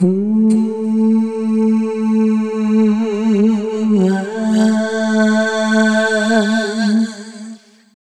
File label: Evaporation, Vocal Samples, Sound Design; Sonic Foundry (Sony Creative Software); Pocket Diva World Class Vocal Samples